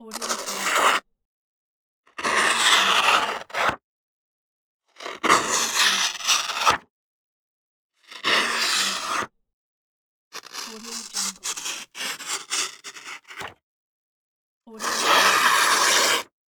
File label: cutting paper